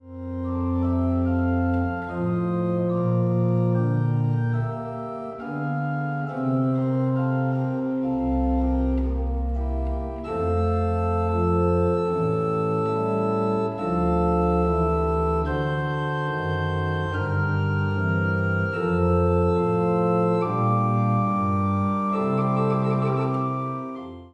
pipe organ; Le Raphael Multiplex

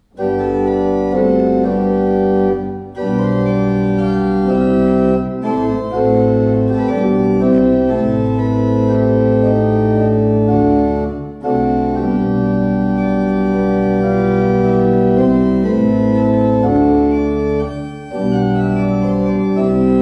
Walcker, pipe organ

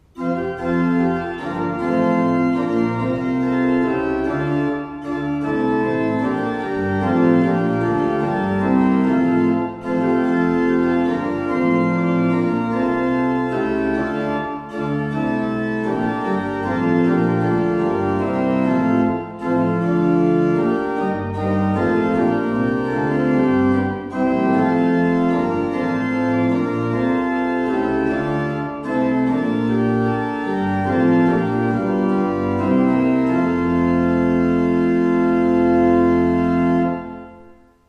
Ott, pipeorgans

